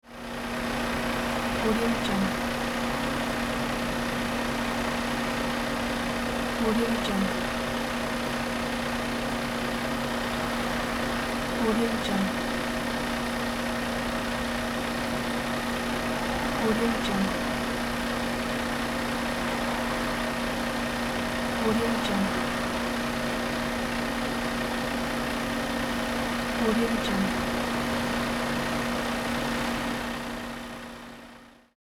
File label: truck engine